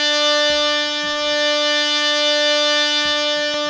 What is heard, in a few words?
HARMONIUM, World Instruments